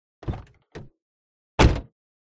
Car Door OC Full Size

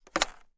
phone handset lift

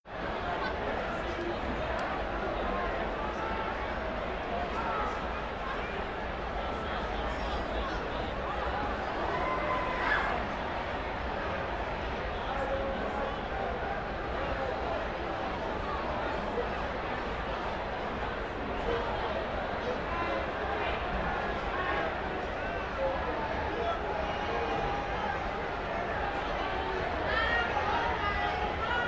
Arena Crowd Idle; sports arena

